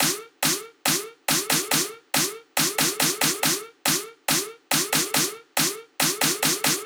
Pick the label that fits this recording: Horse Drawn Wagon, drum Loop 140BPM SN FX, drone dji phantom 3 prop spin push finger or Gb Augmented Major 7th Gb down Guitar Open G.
drum Loop 140BPM SN FX